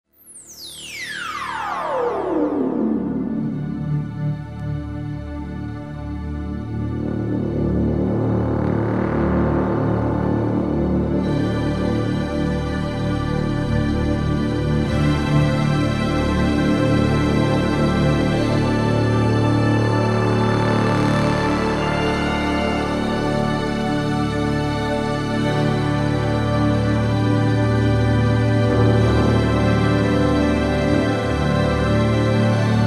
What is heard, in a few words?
strings organ bass moog